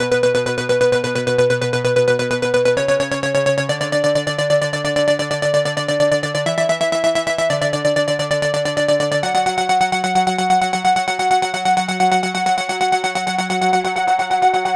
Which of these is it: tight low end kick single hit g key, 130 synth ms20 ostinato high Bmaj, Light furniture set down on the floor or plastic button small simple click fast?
130 synth ms20 ostinato high Bmaj